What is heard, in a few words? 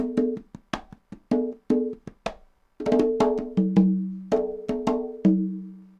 cong bongs 80, latin, 80bpm